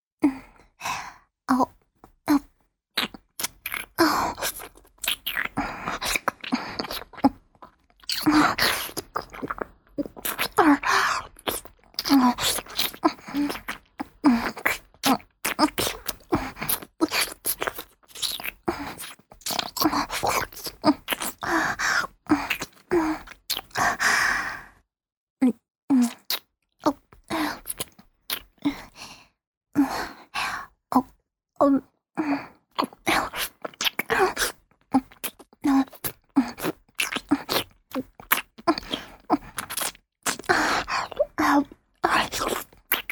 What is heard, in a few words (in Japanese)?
フェラチオ(弱)：亀頭を舐める; 実用ボイス抜粋集